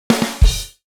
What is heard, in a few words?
drums